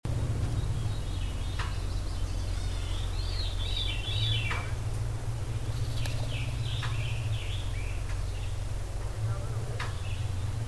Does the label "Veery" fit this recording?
Yes